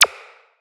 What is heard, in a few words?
Button Press